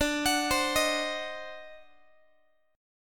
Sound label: D 7th Flat 9th, Guitar; x,22,21,22,19,x; 4A,4E,5A,5D,5G#,6C#; arpeggiated; JGuitar